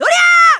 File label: Spin Attack; Toon Link; Link's spin-attack shout